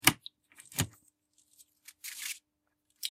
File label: projector loading